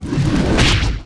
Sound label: WAR ATTACK; SIZZLER; Mob